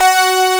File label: SYNTHESIZER ANA LEAD